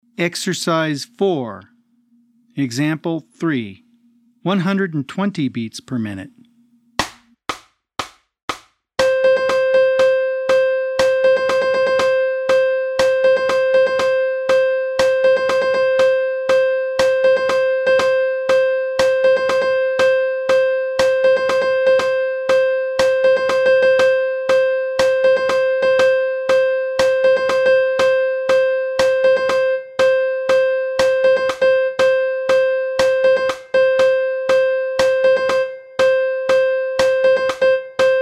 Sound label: 120 BPM